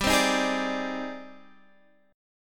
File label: Ab Major Sharp 11th G